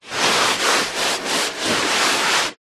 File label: human body clothed move shuffle carpet